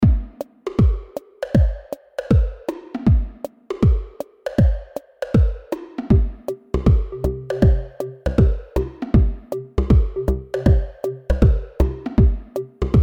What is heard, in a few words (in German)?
68 Prozent Swing